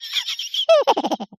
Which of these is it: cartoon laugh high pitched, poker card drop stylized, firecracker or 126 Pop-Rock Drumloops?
cartoon laugh high pitched